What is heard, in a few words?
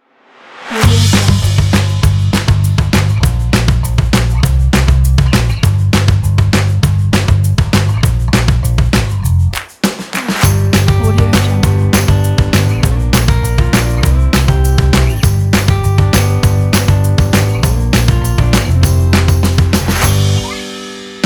music band audio